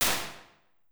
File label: Inside Locker, Impulse Responses; Small Spaces; Indoor Spaces; Apple